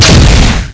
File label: plasma hit; zombie plague